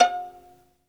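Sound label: solo violin, strings; ILIO; Synclavier Strings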